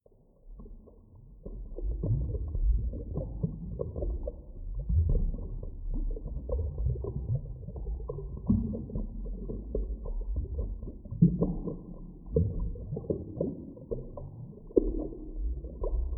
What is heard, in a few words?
Underwater